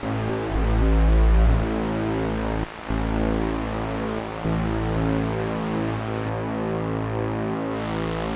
A pulse

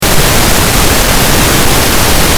pink clipping

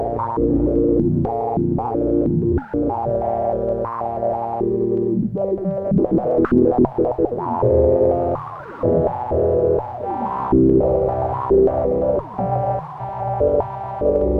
Bb noise

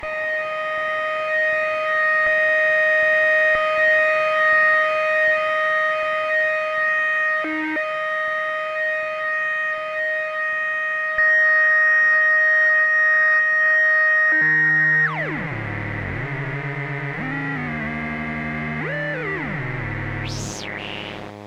Eb drone

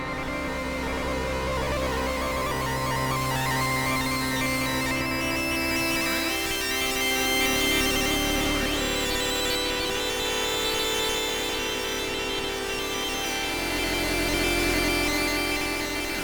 C drone

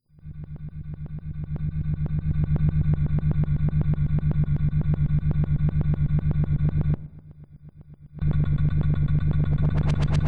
heli noise; chromaris

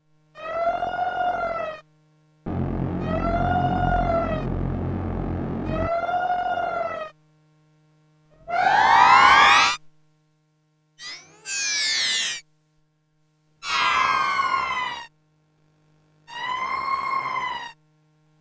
ring noise

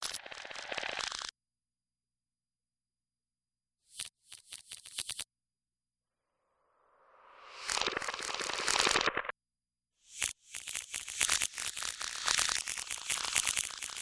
nasty noise

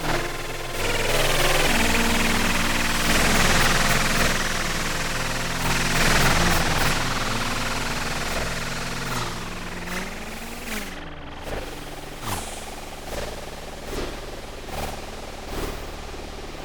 dissonant noise

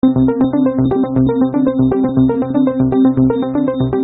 ARP HARP

